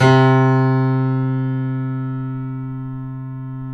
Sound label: KEY, Steinway ff; Roland L-CD701